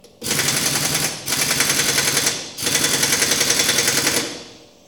Machine Gun

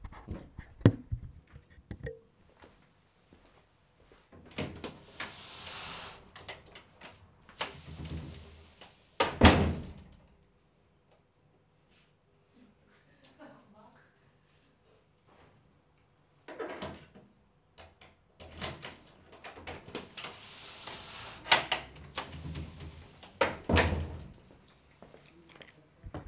Door Porte Entr E

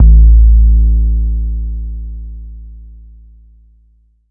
808 A; Abletunes

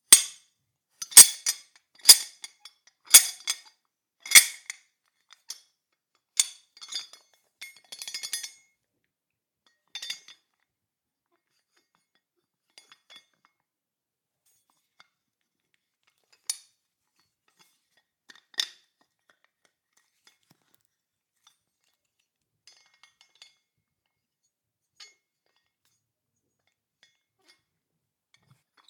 Metal Clings

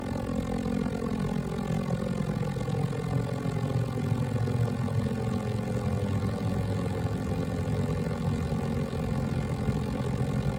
Bi Plane Dives

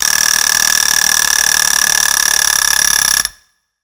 Ringing

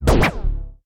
Swooshy Fight Straight Hit

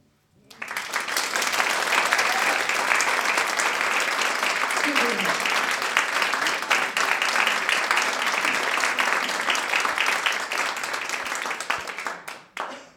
Applause

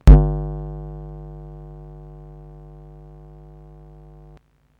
Display Magnetic Field

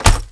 Book slap thud

